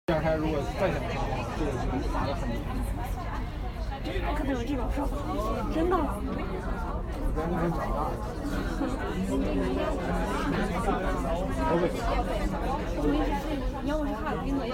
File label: tram sound